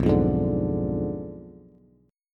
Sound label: B Diminished 7th B Bass; Bdim7 strummed; 7,8,6,7; JGuitar